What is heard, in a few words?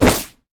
electrical surge; LoL; kennen